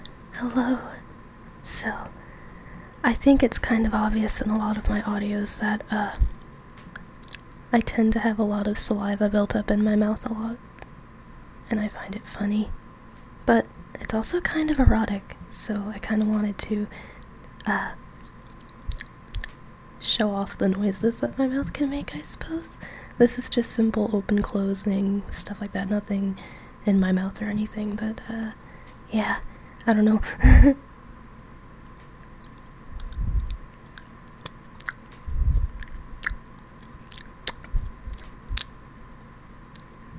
Mouth Sounds!!! [Saliva noises, saliva buildup]

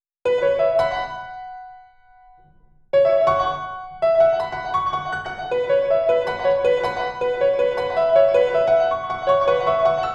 Melancholy piano

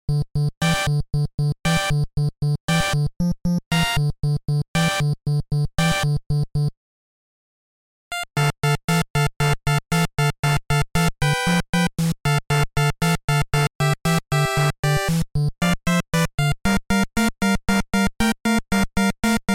8bit

